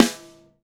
TY Rim, Roland BK-5, Snares

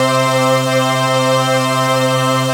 C5, STRING; CINEASTIC; Q Up Arts; Dream Experience

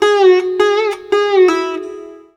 SITAR LINE; SITAR RIFFS2

